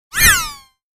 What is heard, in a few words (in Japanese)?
ヒュン音